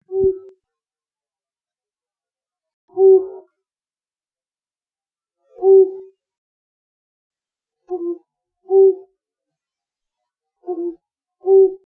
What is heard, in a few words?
Flammulated Owl, bird calls